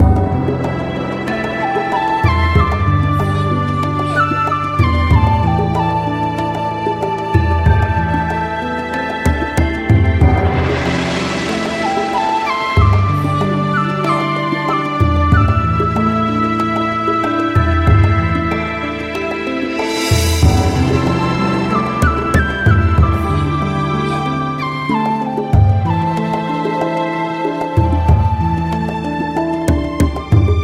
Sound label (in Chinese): 主场景